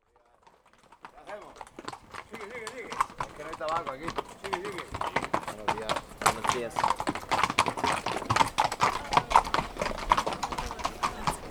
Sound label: Horses Passing by Fuerlos